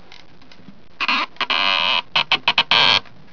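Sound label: nail pull; 8bit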